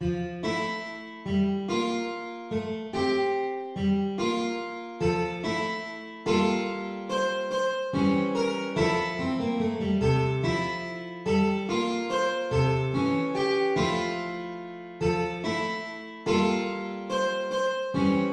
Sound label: guitar